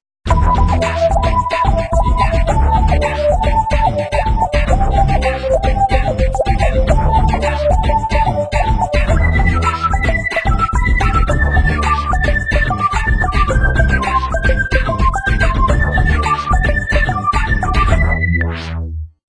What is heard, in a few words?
rave